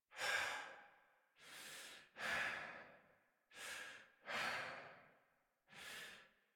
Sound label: Breath Heavy Slow, Adult, en; Warframe; New War; Adult Operator